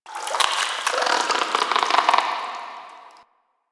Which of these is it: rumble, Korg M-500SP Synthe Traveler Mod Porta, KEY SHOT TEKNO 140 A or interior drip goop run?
interior drip goop run